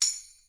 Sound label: tambourine